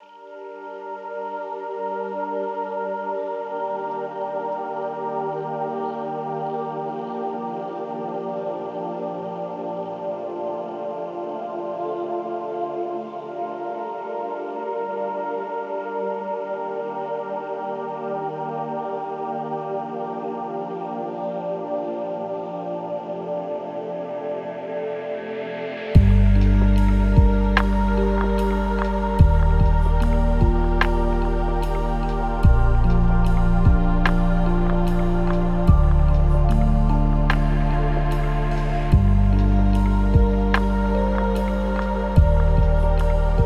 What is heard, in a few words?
lofi hip hop